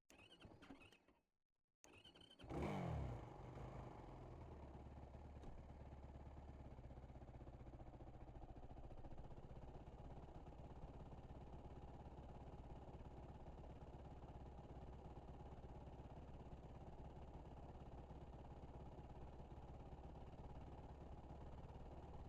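Yamaha WR450F, Onboard, Start, Drive, Steady RPMs, Engine; 2019; VP88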